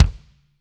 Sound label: BD, Drums; Bob Clearmountain Drums I